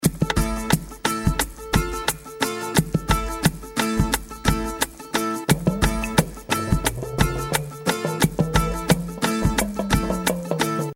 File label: loop mono